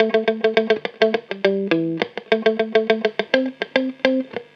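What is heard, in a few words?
guitar, Roots Funk; USB Soundscan vol.41; AKAI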